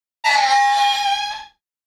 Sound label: eeep